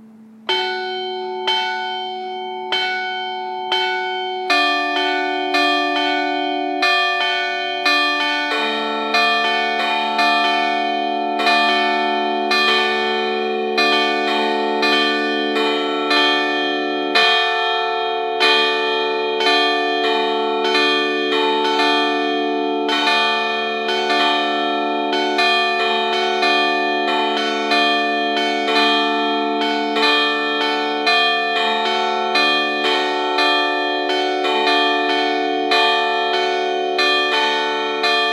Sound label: peal only